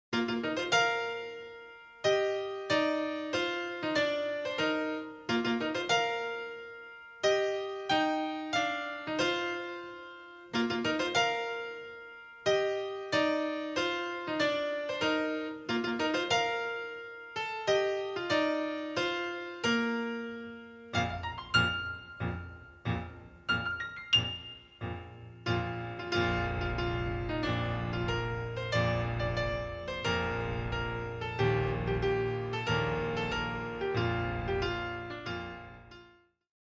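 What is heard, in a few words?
Battle Hymn, piano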